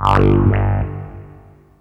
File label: Sweep Bass, Drum & Bass; Fluid Dynamics